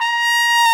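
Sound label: trumpet; Roland L-CD702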